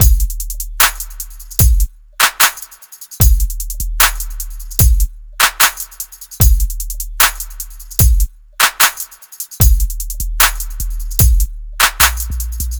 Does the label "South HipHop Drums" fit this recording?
Yes